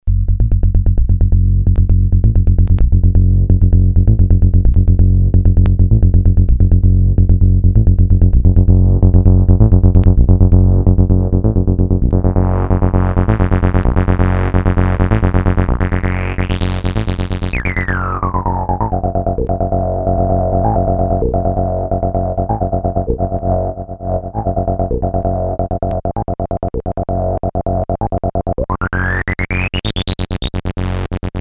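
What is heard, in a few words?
bass cutoff, Moog Minitaur Bass Synthesizer; analog monophonic synthesizer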